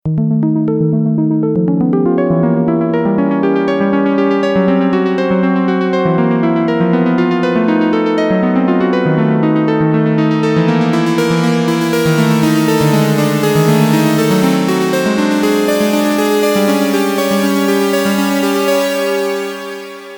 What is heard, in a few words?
Arpeggiated Melody; Vexations